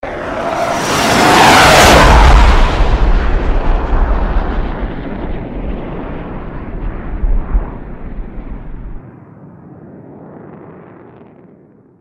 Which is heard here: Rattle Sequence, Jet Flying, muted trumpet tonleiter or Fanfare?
Jet Flying